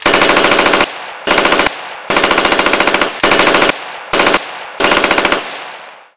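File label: SAW Firing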